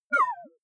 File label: query smooth wobble, signale